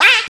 duck; Animals

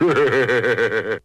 laugh evil scratch; ooh ahh

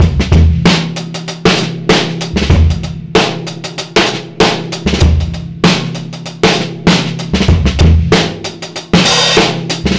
Drum loops, Boombap

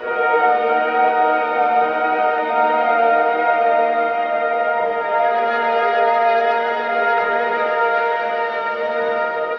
strings, Sad

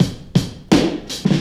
Drum loops, Boombap

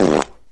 fart short

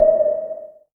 quba, Misc Percussion Ticks

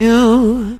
yoooo, Voice

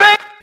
car horn

